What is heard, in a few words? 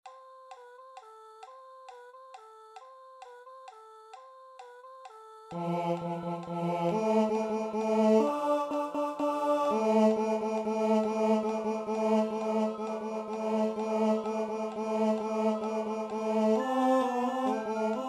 Bass